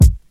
Acoustic Kick Drum Sound C Key, Royality free kickdrum tuned to the C note.